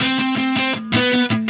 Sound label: Guitar; Sonic Foundry; Indie Rock Anthems; Happy Pub